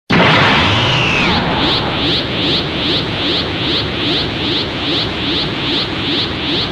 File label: transformation